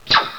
slinky

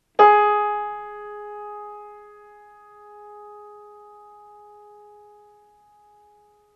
piano G sharp

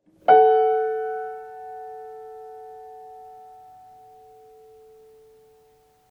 Kawai Major Sixth A F sharp

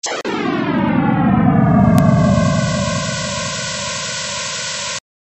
Gobi sand

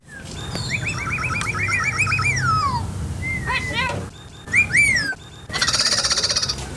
cockatoo

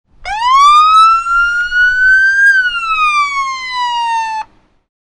sirens wail

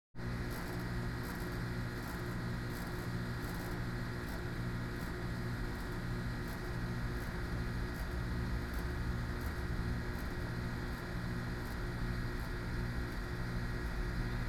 carrier

